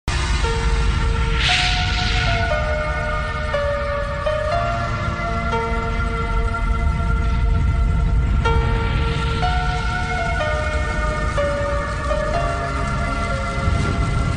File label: sad ringtone hindi instrumental; koyla